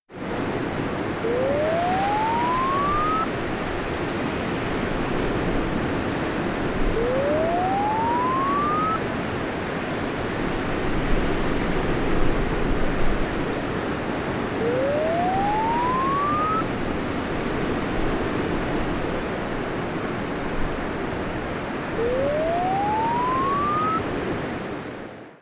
Sea Waves

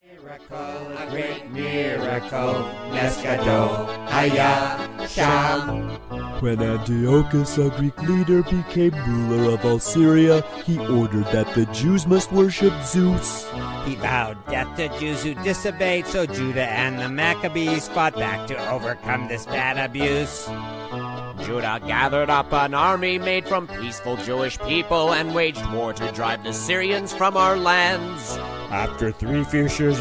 hanukkah song

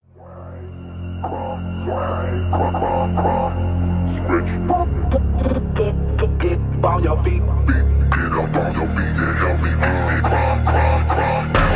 Trap And Bass Music Party Music

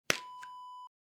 pill cointainer close

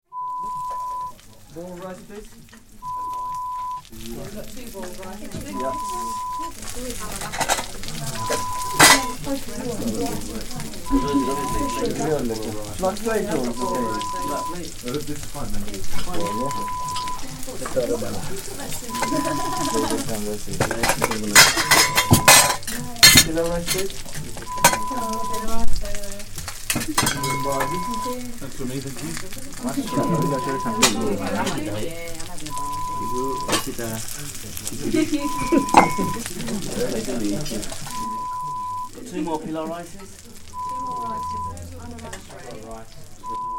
Indian Restaurant sound

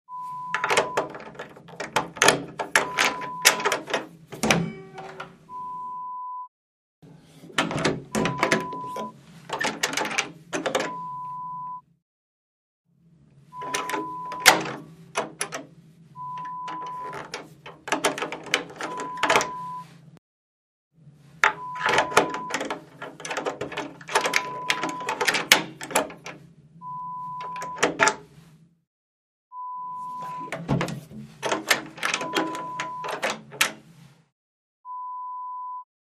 Door Key

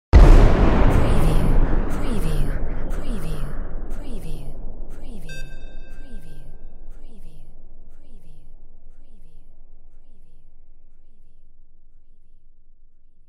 WAR CANNON; windy beach audio

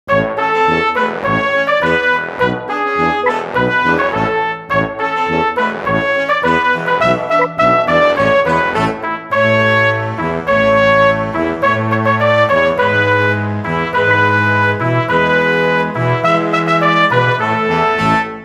Brass; Shoo Fly

